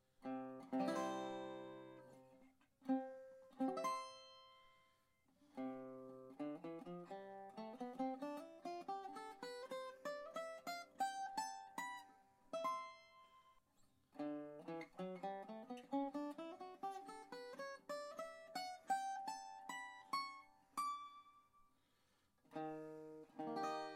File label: National Tricone Tenor